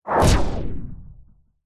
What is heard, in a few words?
sound design cinematic whoosh hit short fast mod delay futuristic